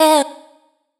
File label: Glide C; Noises; Synthetic's Serum Collection